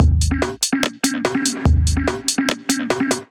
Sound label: Kit Melodic 145 bpm, Drums, Complex; MIDI Clips; Ozark Glitter Kit; Ableton; Factory Packs; Skitter and Step